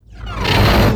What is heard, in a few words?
Music Main Menu Out; XDK4627 BIOS and XDK Launcher and Dashboard(for Retail); ogxbox